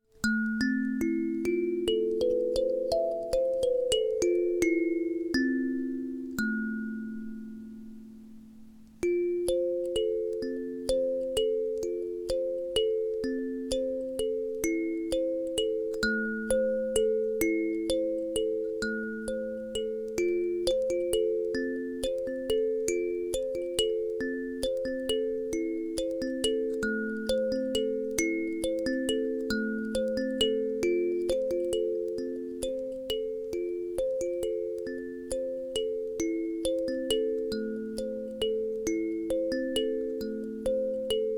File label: 8 Note Tuned, kalimba